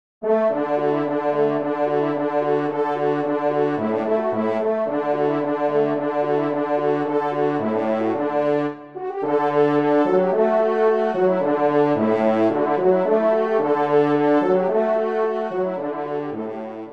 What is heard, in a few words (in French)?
TRP